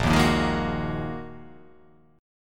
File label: A sharp 7th Suspended 4th Sharp 5th down